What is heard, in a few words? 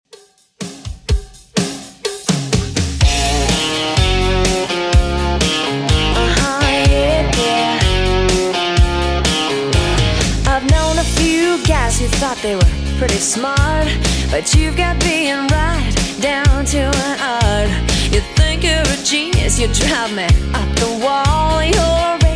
90s Redrum 125bpm